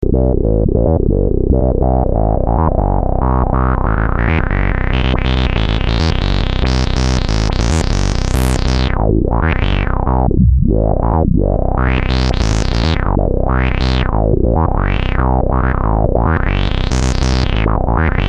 800 filter

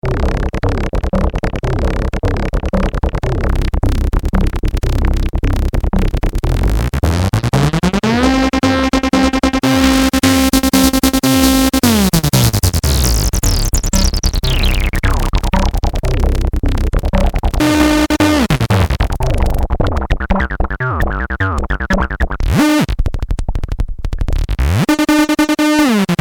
acid FM sick

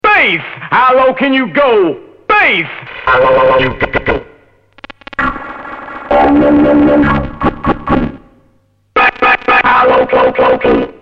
vss200 PE bass

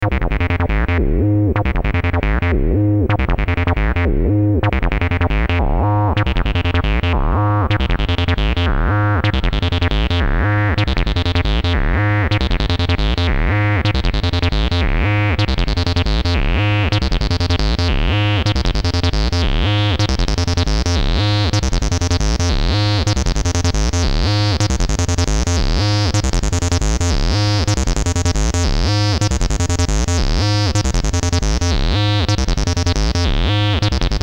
c64 acid squelch

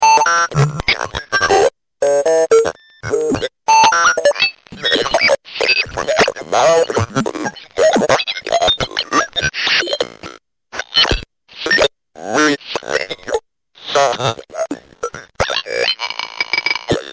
multiglitch

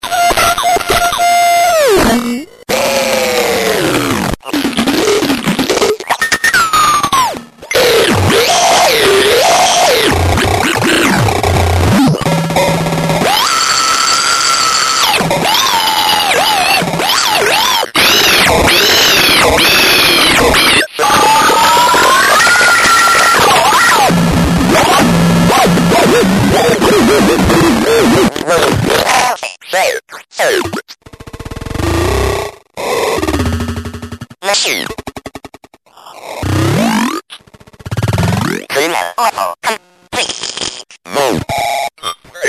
pitch

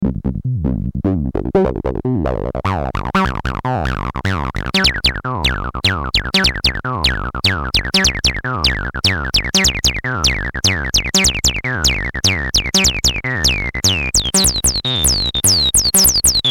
acid FM reso drive

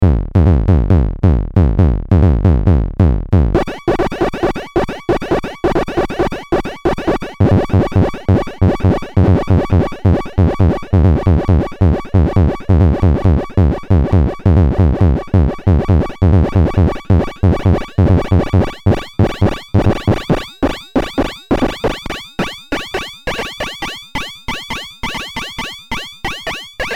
front drum beat

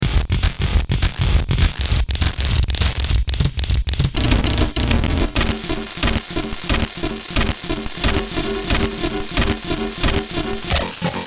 xr10, drum

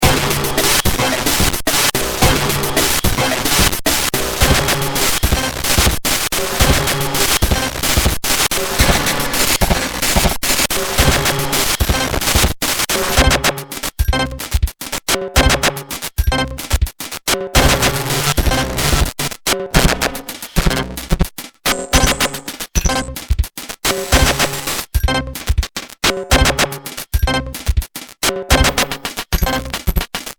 rx8 demo, drum

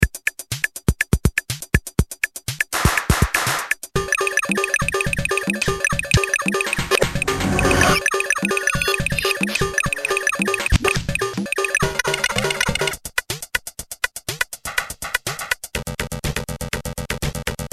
xr10, drum

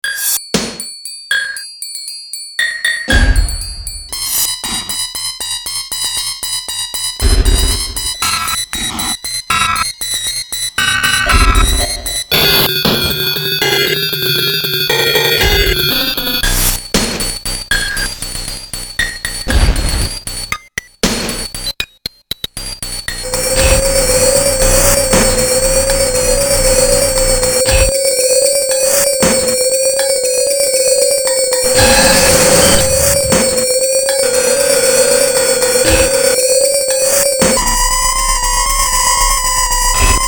SR16

